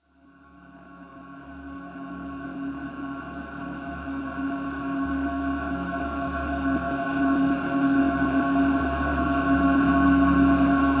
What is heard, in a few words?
Noise Zone